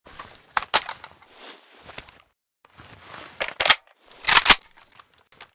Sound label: reload, FAL assault rifles; 762mm